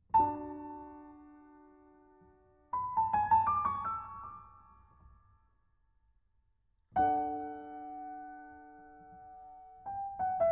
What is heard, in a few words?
Variations on a Minuet; by Duport; K. 573